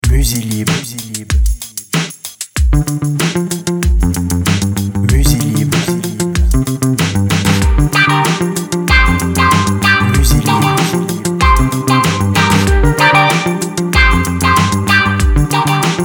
groovy summer, jingles

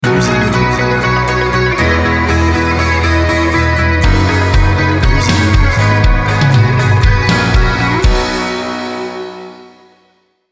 uplifted jingle

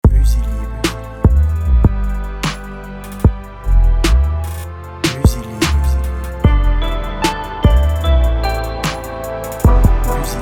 sweet drill, jingles

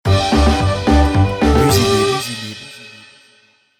country, jingles

